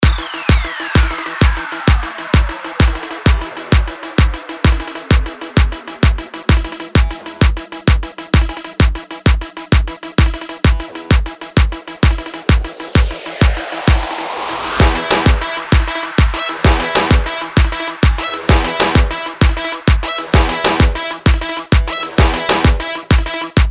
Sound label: Noise Remix